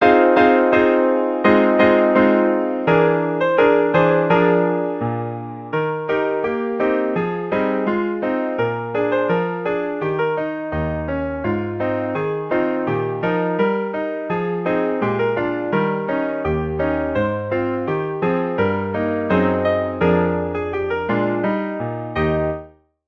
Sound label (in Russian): Танец около елки